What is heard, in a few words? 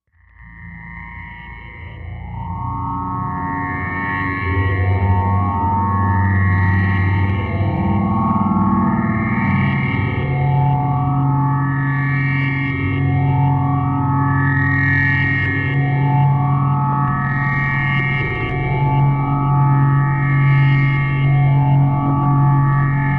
Repeat Transit Sci Fi Sp, sci fi ambience